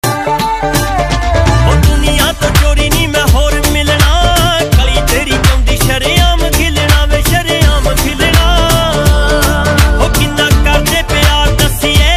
Punjabi

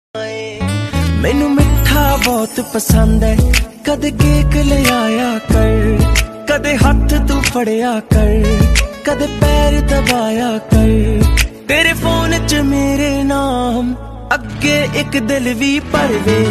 punjabi song ringtone

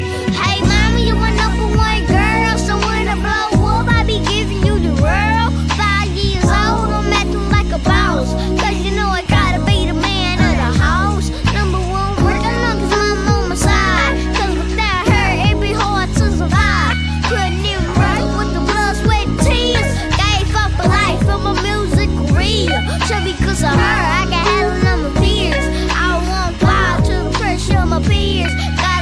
hey mama baby rap

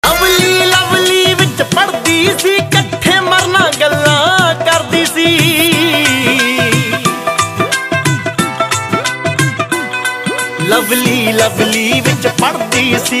Punjabi

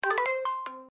notification; zendesk

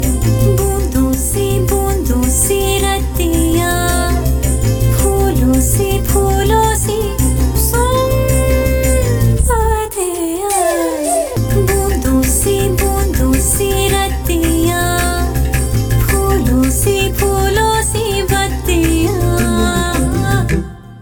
hindi ringtone; mamangam

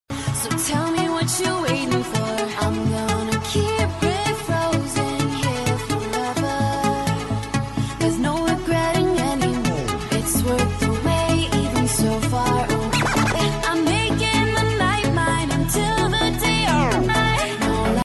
ringtone

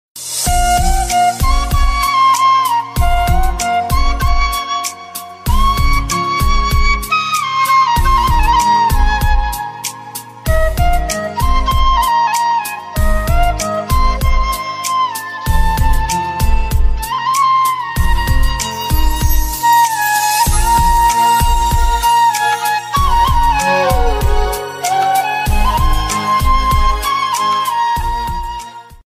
Flute Instrumental

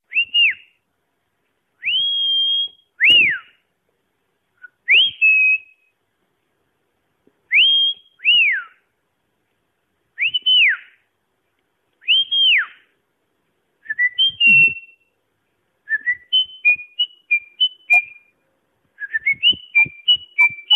whistle ringtone whistle for boys

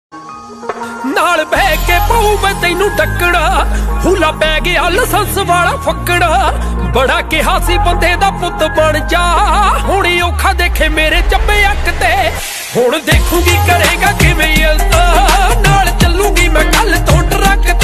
Punjabi Song

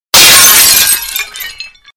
glass

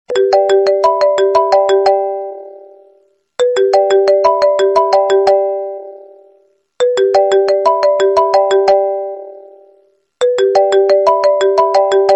iphone sms

